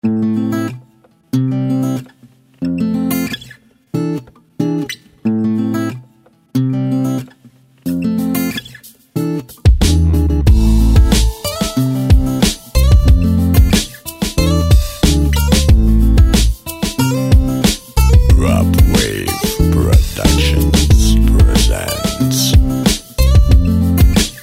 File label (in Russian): Guitar, rep minus s gitarami